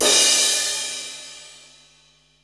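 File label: cymbal crash; 300 Drum Machines; Yamaha Motif ES-Series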